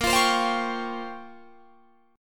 A sharp Minor 6th Add 9th A sharp down Guitar Drop D, chord